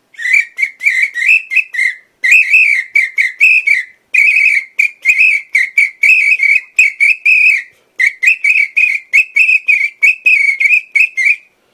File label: apito; instruments